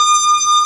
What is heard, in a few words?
B3 E 5, ORGAN; VOCAL ORGAN; ORGAN #1